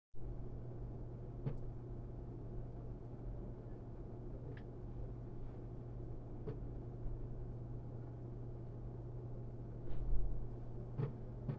WD Red Pro 20TB Noise in a Synology DS920 NAS IDLE